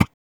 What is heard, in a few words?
Mouth Interface; ArmyRaid; Interface and Item Sounds